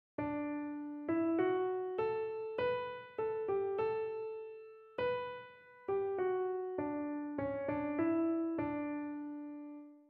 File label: Continue the melody example with answer